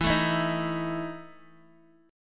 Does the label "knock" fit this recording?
No